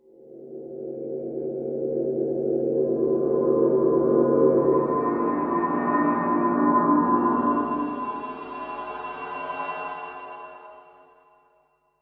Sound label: Black Hole, sci-fi atmos